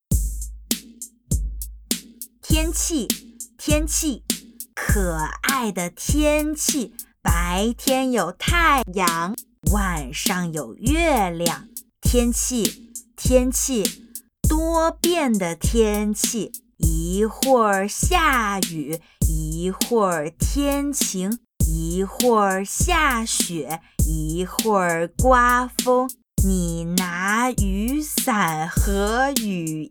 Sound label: Chant